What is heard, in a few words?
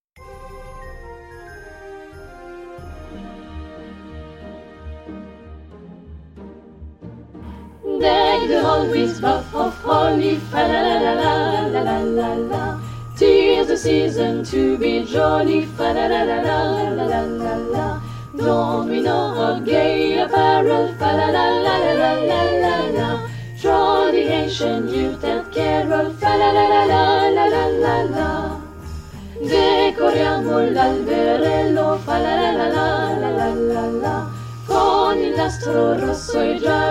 tutti; Deck the hall